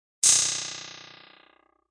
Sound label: Clickie; Clicks; Multimedia